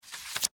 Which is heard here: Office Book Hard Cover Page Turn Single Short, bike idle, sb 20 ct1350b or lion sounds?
Office Book Hard Cover Page Turn Single Short